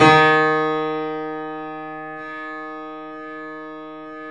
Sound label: The Piano D#; InVision Interactive - The Piano